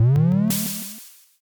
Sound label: compressor